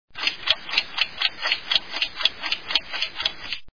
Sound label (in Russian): tresk sekundomera